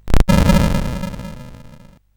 Chopper, CSG8580R5

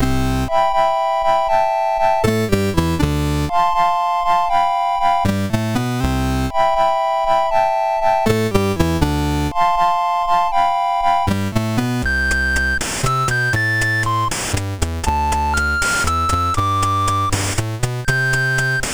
Ballad sid MOS6581R3

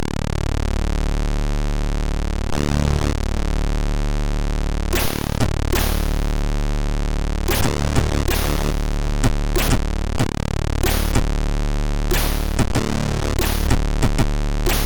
Funk, MOS6581R2

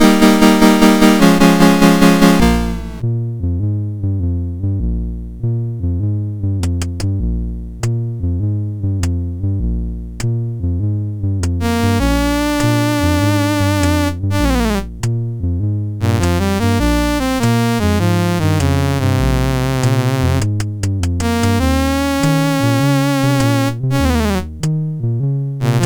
Night Train, MOS6581R4; Roger Ambill

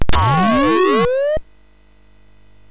FX, MOS6581R3; HVSC; Software House

